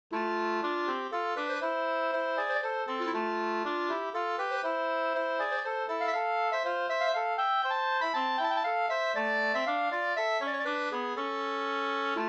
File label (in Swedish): polska Bodapolska